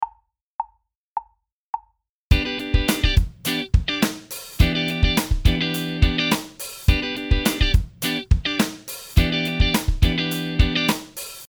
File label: Minor 7ths